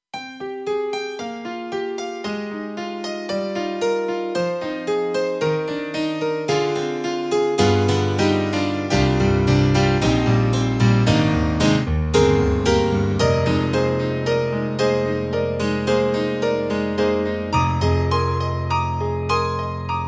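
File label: bright acoustic piano, TRITON Rack; KORG EXB-PCM08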